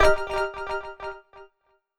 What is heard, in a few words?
button